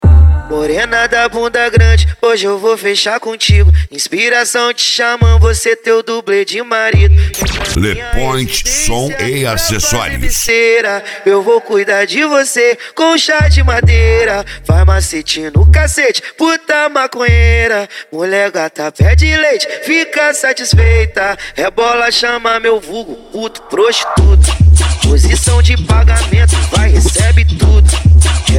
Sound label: funk mega bass